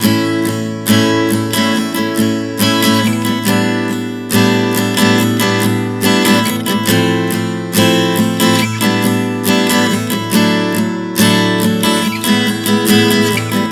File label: Progression 070 A-G-F-D-E, Acoustic Guitar, Chord Progressions 070 bpm; Sonic Foundry; Songwriter Acoustic Guitar Companion